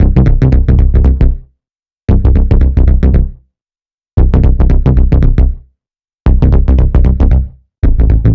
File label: 80s Bass